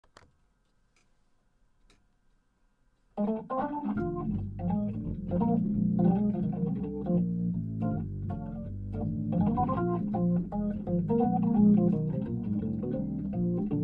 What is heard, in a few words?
hammond piano